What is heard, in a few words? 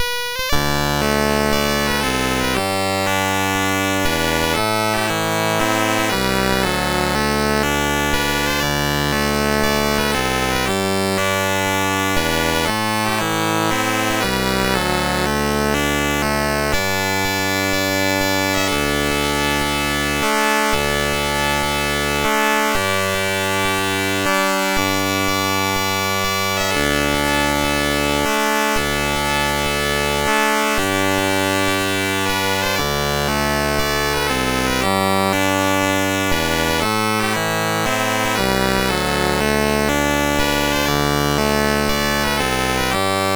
BASIC, MOS6581R2